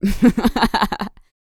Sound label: LAUGHS, Vocal Planet Jazz Fx